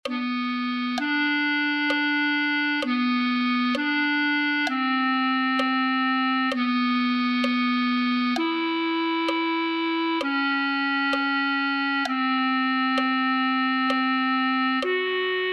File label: clarinet